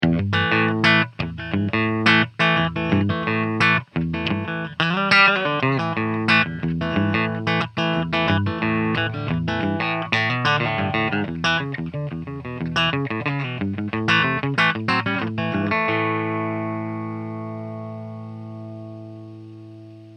sound sample country riff

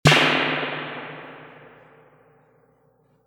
reverb tank 3-spring short drum machine snare

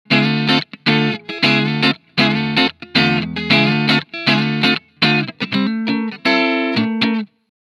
funk rhythm dry

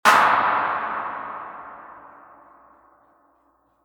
reverb tank 2-spring short drum machine clap

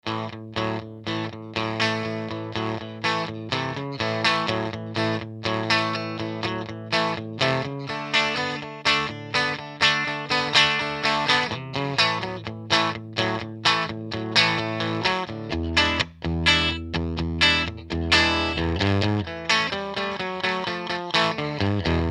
riff low boost treble cut; tone attack

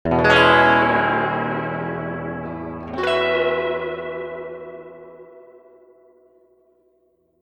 reverb tank 2 spring medium tremolo chords

